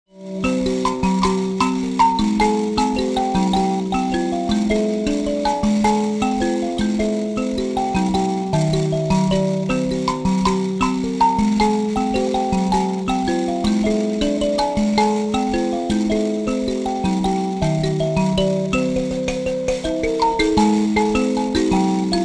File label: mbira